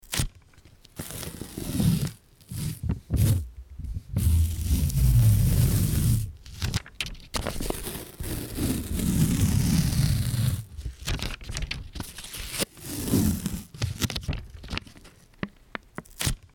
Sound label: packing tape